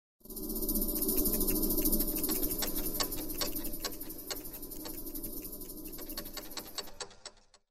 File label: jackpot slowdown; poker game